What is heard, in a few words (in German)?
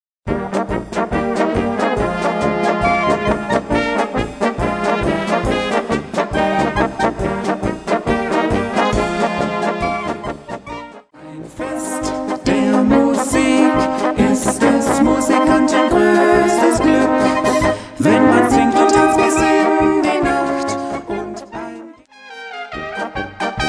Polka